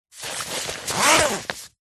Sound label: luggage rucksack backpack zipper; zapsplat